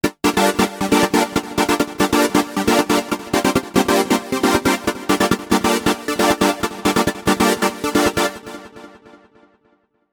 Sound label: Lead; Lead14 Sierra Velone; microq